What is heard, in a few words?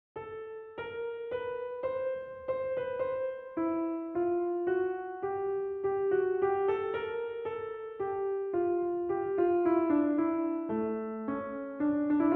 Chromatic Fantasia and Fugue in D Minor, Fugue; BWV. 903